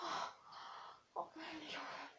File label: click